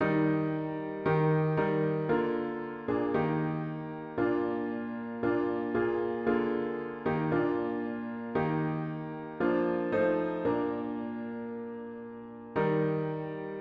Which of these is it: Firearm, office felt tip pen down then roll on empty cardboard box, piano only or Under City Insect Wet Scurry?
piano only